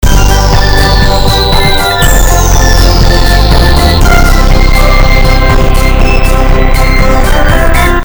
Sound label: depressing rock music